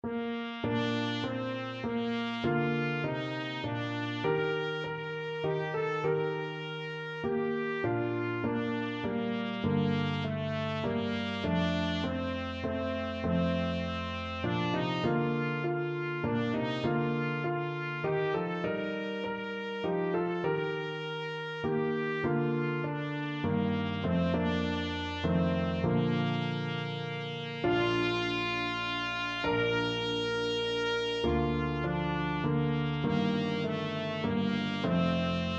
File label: welsh nat anth TPT, trumpet